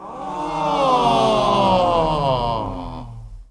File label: Crowd Ahh